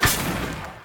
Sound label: counter burst; druids dream